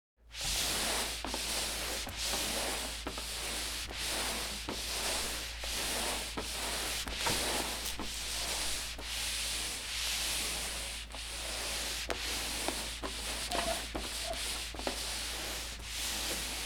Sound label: Mopping floor back and forth movement space mop